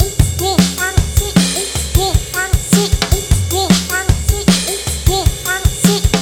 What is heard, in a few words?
synco solo